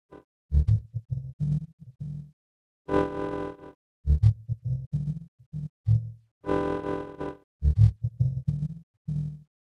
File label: bass and horns; Sound Source Separation Using Sparse Coding